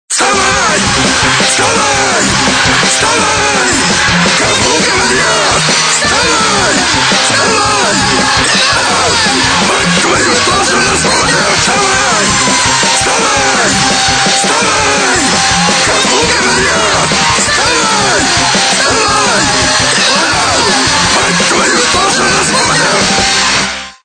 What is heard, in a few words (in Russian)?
Vstavay rok budilnik